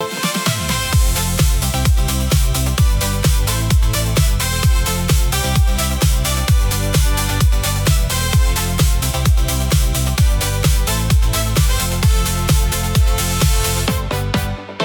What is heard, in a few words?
loop